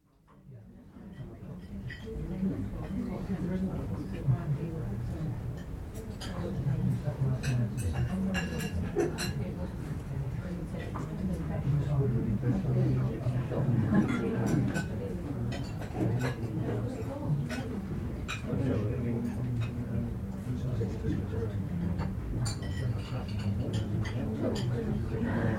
Quiet Restaurant, subdued conversation in small restaurant (indistinct speech); BBC